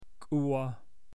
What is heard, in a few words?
Sheep